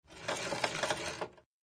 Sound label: crank; p00gie